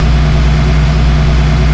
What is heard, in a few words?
engine loop